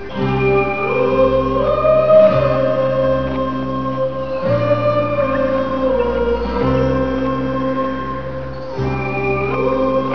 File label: tune; chaos